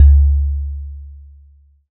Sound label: Udu; resynthèses; Diphone Resan